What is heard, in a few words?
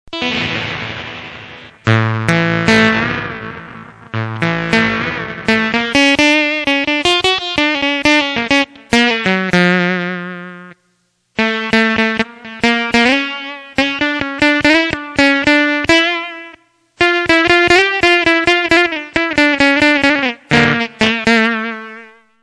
jawari